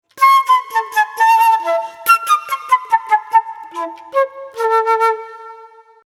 impro flute traversiere; loto sonore

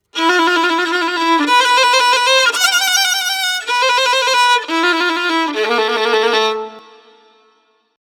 impro violon; loto sonore

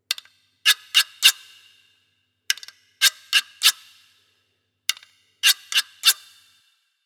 impro guiro; loto sonore

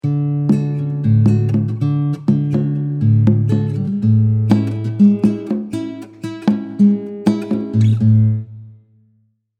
duo guitare et tambourin; les sons en duos

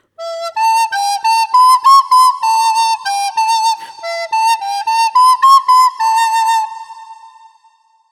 impro flute a bec; loto sonore

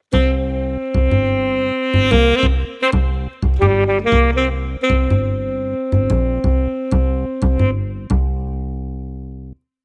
duo contrebasse et sax; loto sonore

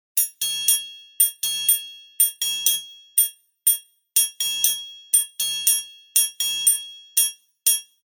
impro triangle; loto sonore

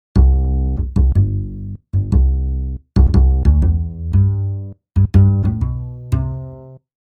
impro contrebasse; loto sonore

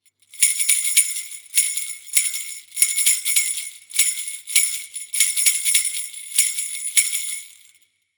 impro grelots; loto sonore

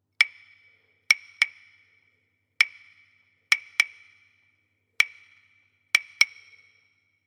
impros claves; loto sonore

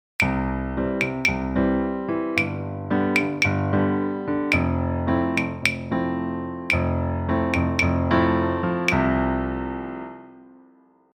duo piano et claves; loto sonore

